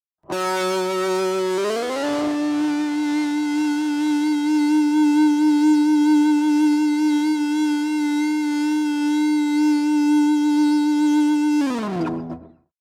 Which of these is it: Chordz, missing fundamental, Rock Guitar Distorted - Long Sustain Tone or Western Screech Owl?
Rock Guitar Distorted - Long Sustain Tone